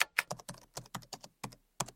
chat Button

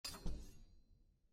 ui nav forward; townguard; everdawn

